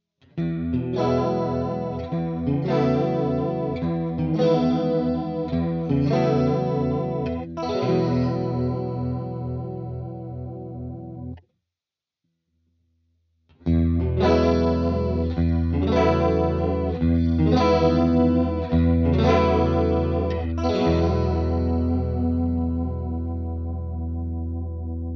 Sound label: Chorus